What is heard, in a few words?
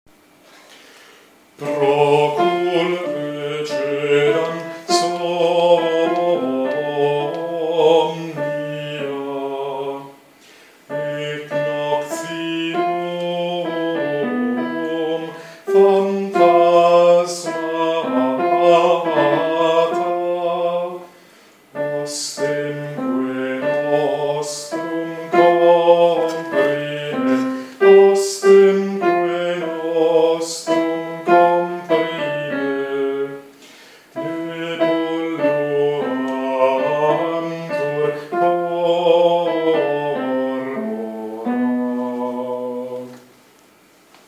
Te luci Contralti; Tallis; repertorio polifonico